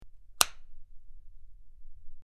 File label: Switch Big Metal Ligh, switch clicks